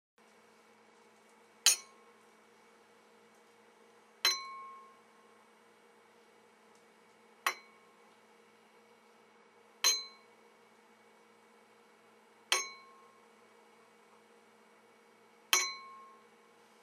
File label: Glass Clink